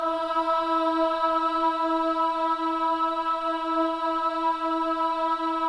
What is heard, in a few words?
BOYS AHS, Symphony of Voices